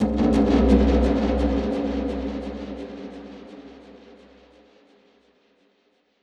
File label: Perc Hit, dub percussion; musicradar